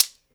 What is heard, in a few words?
MARACA, Percussion; InVision Interactive; Percussion Slam; BOLERO